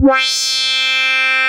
Square Peg, Synth Lead; Bass; Ableton; Creative Extensions

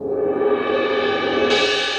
Suspended Cymbal Mallet Rolls; Ableton; Orchestral Mallets

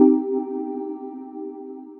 Pad; Norway Swords

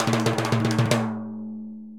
Timbales C L Roll; Latin Percussion; Drum Hits; Ableton; Factory Packs

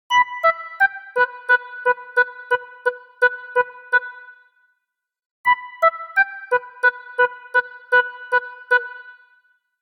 Dirty Funk Lead E Minor 90bpm; MIDI Clips; Melodic; Ableton; Factory Packs; Chop and Swing

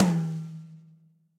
Tom High Big Punchy; Drum Hits; Ableton; Factory Packs; Session Drums Club; MPE Hits

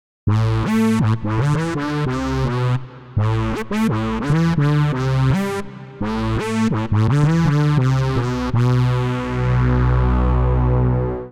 Brass A Minor 84 bpm; MIDI Clips; Tonal; Melodic; Flyte Brass; Ableton; Factory Packs; Chop and Swing

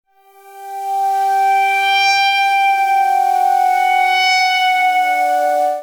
Pad Bm 124 Bpm; MIDI Clips; Music; Kind Heart; Ableton; Factory Packs; Vibrations; ModeAudio

